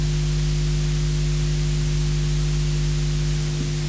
Tape Noise

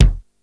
kick, Yamaha RY-8

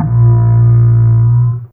Humans; Fairlight CMI IIe

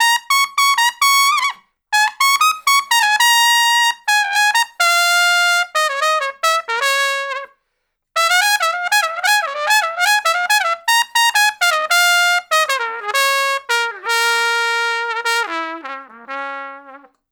Trumpet Solo, Jazz; Traditional; Sonic Foundry (Sony Creative Software); Jazz Solos and Sections

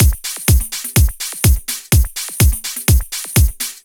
Drum Loops, 125 BPM; Sonic Foundry (Sony Creative Software); Underground UK House & Electro

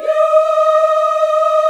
D#5, CHOIR YAS; AFRO; Spectrasonics; Heart of Africa

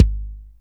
Kick, Akai MPC500; Toolkits

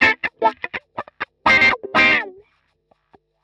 125 wah C, wahwah; PowerFX - Nu Jazz House

